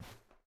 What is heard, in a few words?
Step Carpet; miside demo